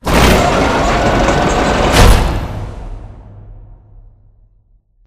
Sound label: Assembly Furniture Rail Close; Boomtown; Titanfall 2